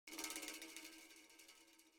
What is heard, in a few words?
Spring Drums; Frozen Flame; windows